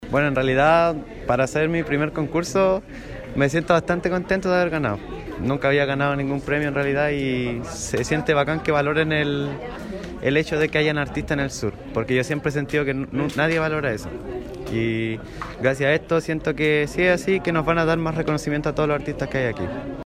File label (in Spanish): Voz Joven; Ganador Concurso; concurso municipal de fotografia y canto